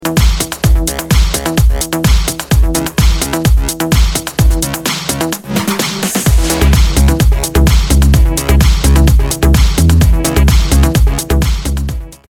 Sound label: build up